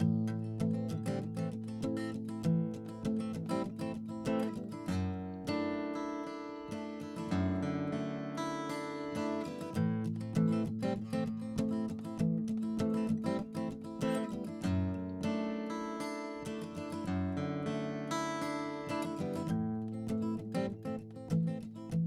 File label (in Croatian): SM57 tijelo; Reaper project